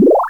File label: level up